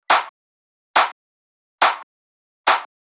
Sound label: drum Loop 140BPM Clap; Vengeance - Essential Clubsounds Vol.5; VEC5 Drumloops; VEC5 Multi Loops 140BPM